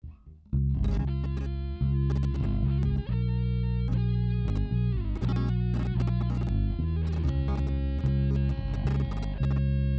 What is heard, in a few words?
nodrums inst sim; SASSEC07